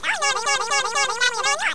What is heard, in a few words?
gerbil; Kids' CD-ROM Bible Fun Pack; Education Publishing Concepts; NOAHDEMO